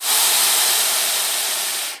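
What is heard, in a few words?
hiss of a venomous black cobra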